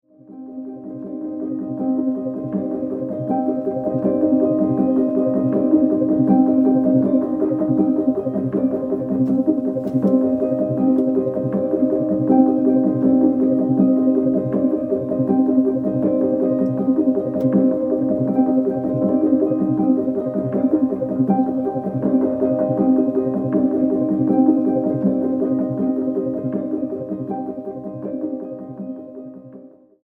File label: Rhythmic Movement; Depatures